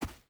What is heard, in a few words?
footstep boots sand run; Rust